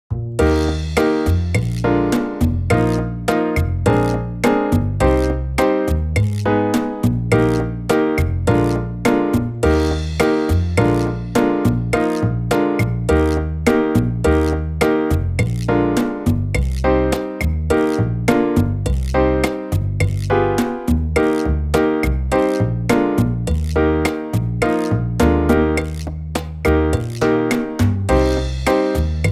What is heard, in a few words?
Eb Hold Your Breath, brass